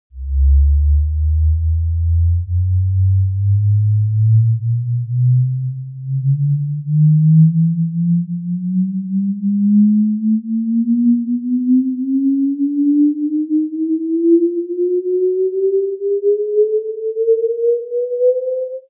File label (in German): Sweep 60 600 hallig